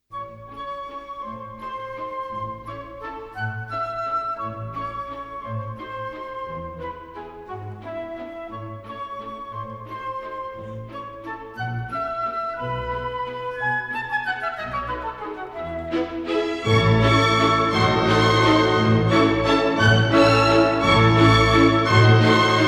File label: Vals fragment; M Glinka